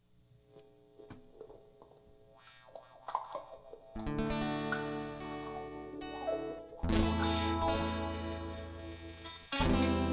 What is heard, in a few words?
Instrumental; hyper terrestrial